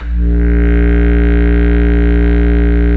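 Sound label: Bass Clarinet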